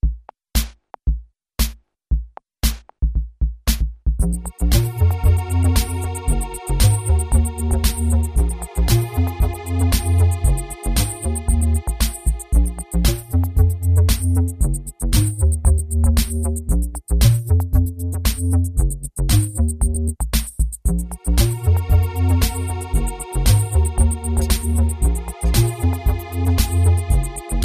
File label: midi beats; weeklybeats 2012